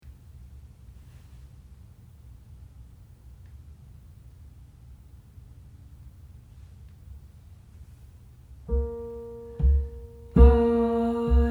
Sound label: Just A Closer Walk With Thee Tenor